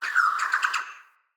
flock projectile ready; Enemy; Abilities; Thawtide Hunter; Sinecure; Ranged Hunter Thawtide

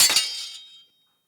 Dagger Impact Block; Weapon Impacts; Daggers; Combat

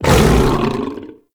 Cast Impact Vocal; Warframe; Uriel; Infernalis

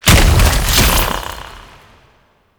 Fusion Pillar Impact; Warframe; Qorvex

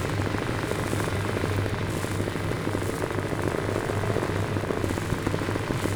Rocket Launcher Fully Charged Loop

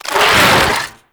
Legs Roll B; Amalgam Moa Satyr; Abilities

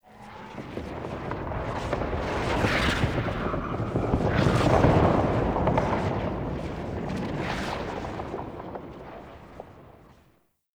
Wind Crackle Rumble; Tau

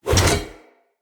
Heavy Whoosh, Swords; Weapons; Mockery; Mockery Brazard

